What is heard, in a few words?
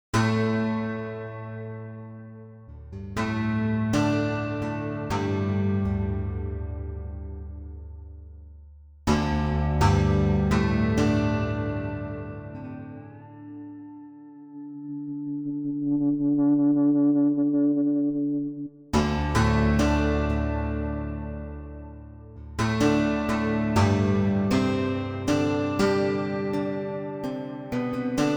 Zither; Arcade Rose